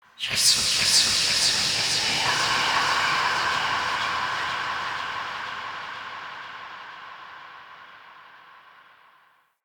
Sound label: Spirit Tree Holy Chatters, Ambience